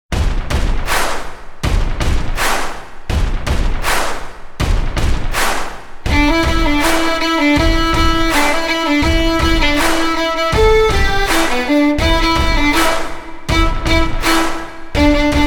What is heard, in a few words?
string quartet